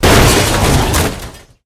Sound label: vehicle impact heavy, v8; Source Engine; Portal 2